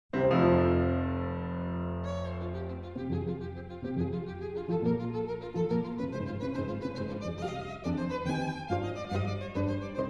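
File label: piano quintet; kiel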